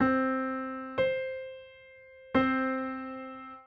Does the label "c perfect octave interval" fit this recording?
Yes